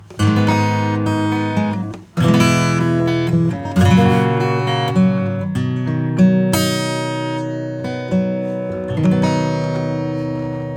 Acoustic Preamp; TH-12 RELEASE

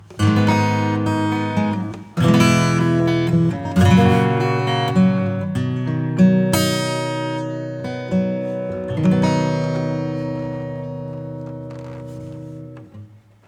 acoustic Clear Hall; XenoVerb

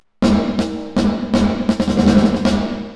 MIX Blend Mod LFO Rate 1.69 Hz; Reverberate3

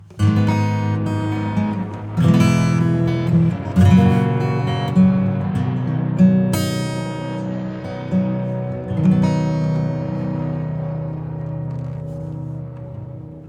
Reverb Acoustic; MangledVerb

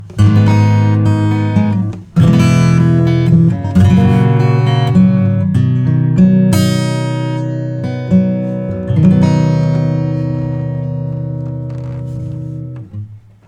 acoustic OPTICAL FAST 100; Joe Chiccarelli

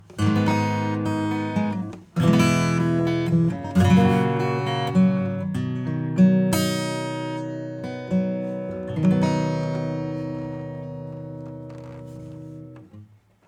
acoustic bypass; Spectre

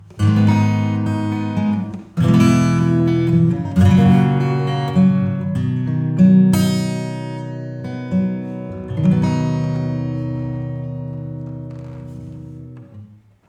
acoustic Rear Studio

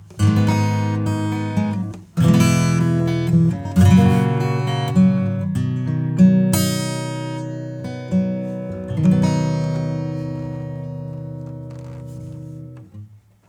acoustic Wet 100; Oxygen

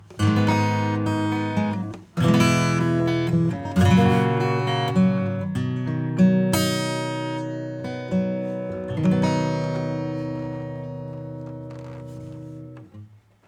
acoustic bypass; COSMOS

